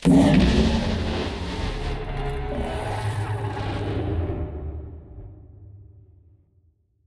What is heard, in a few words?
void worms juvenile death; Stellaris; grand archive; ships